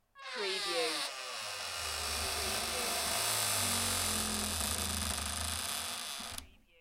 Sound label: Door hinge squeak; beanstalk audio